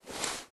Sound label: clothes generic foley